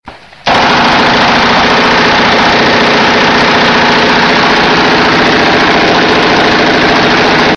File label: Mini Gun